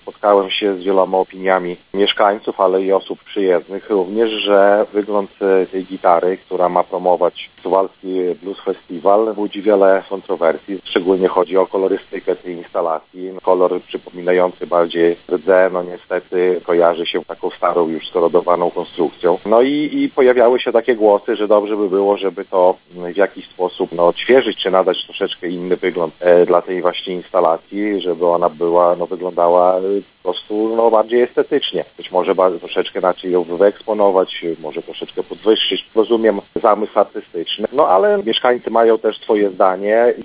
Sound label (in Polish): gitara; Suwalska gitara przedmiotem kpin?